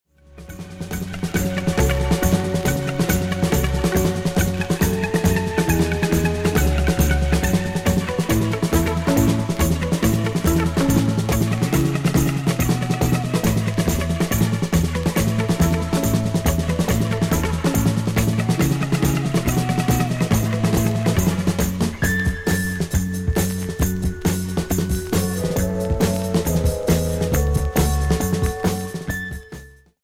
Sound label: moogs